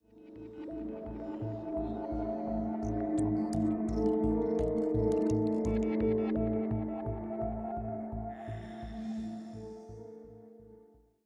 85bpm; Research High; Legends of Pegasus; windows gamerip 2012

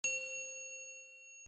Quest Accepted; Twist of Destiny

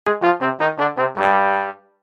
Game Complete Lose; Blockman Go; Android

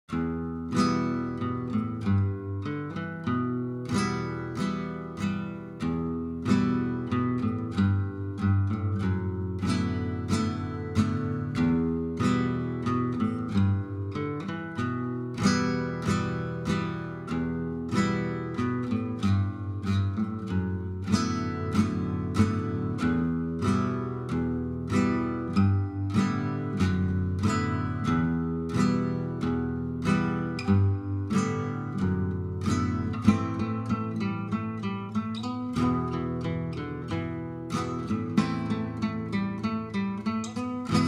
Undead Guitar Song

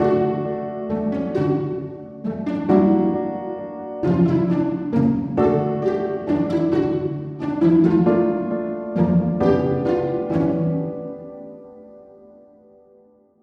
chill break; beacon pines

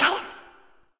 Grumble; Level5 Gollum

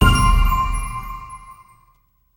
item find; escape from frankensteins tower

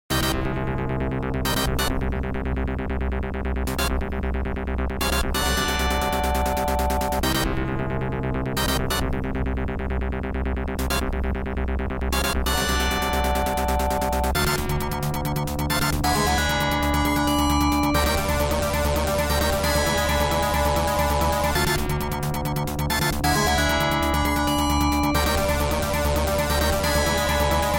Music Impact boss; Heavy Burger

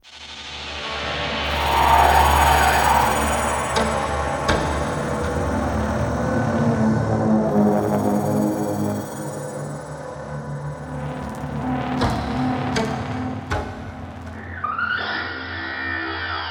80BPM; DalekShip

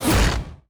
crate open; cardbabies; Animation Throwdown

